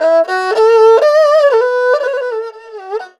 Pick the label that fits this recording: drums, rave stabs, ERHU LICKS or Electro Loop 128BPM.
ERHU LICKS